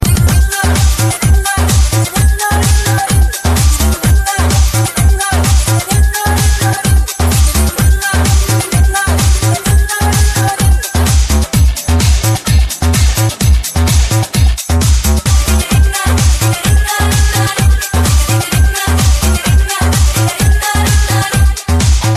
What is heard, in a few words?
Dhol